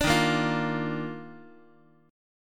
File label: D sharp Major 7th Suspended 2nd, Guitar; strummed; JGuitar